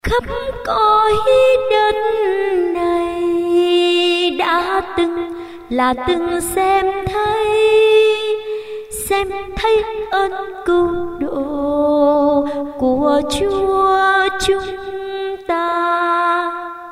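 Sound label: soprano tenor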